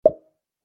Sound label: mouth bass